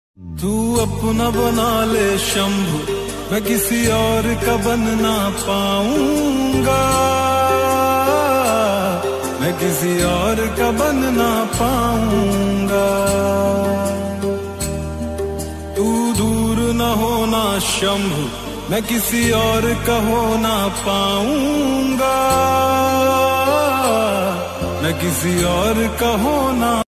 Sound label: mahadev ringtone bhakti love ringtone